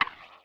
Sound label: breakbeat